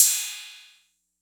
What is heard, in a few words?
Cymbal 808; gate experiments